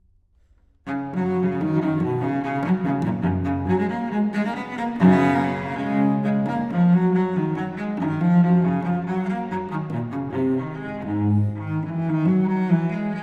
Suite n. 1 in G major, Gigue, Suites for Cello Solo; Gaillard; Bach; BWV 1007